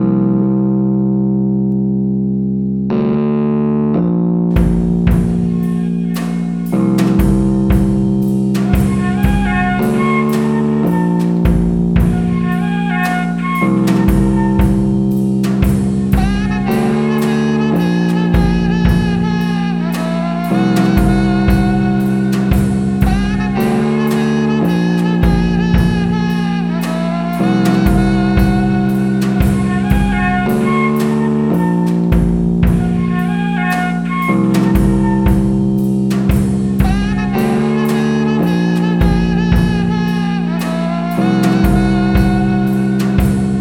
flute

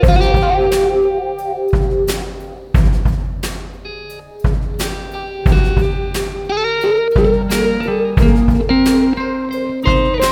premature flute

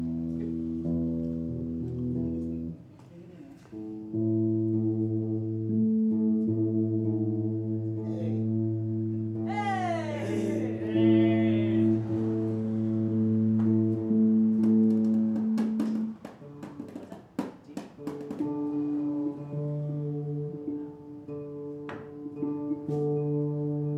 tuning, Jam

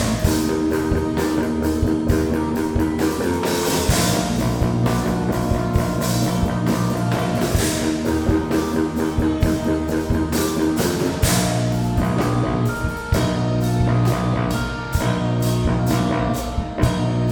Bass Jam

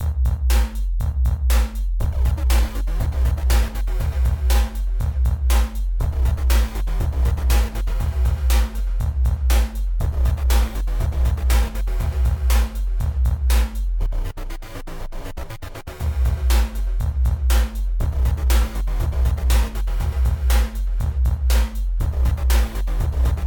synth ditty

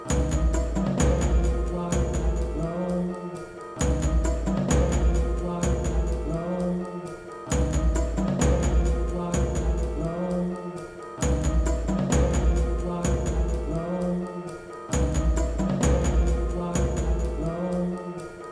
spooky

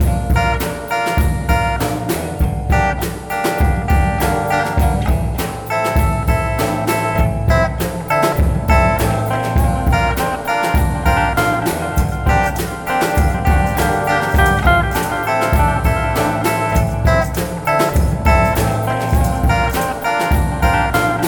low tech bathroom

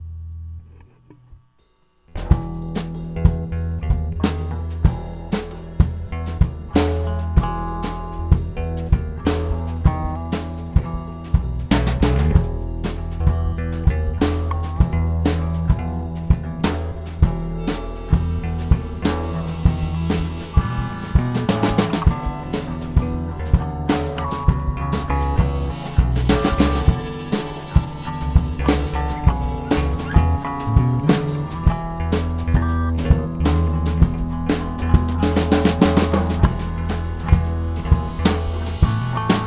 rainy sad sunday

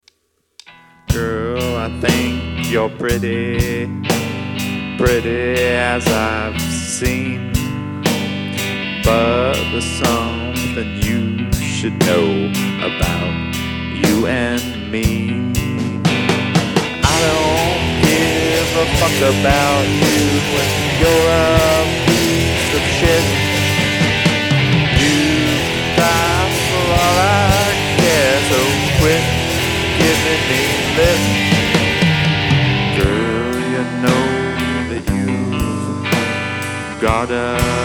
experiment vocals

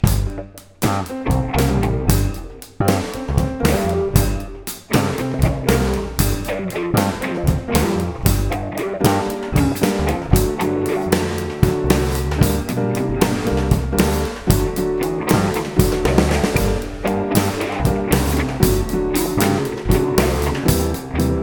nuevo klavier

